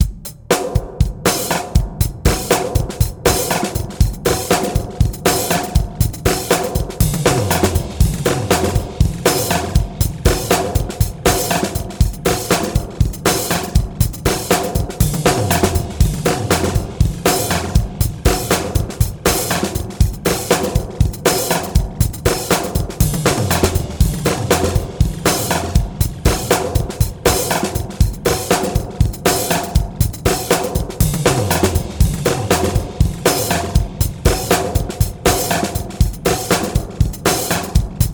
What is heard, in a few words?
EFFEXX RT1701 Pitched Hallway; Radikal Technologies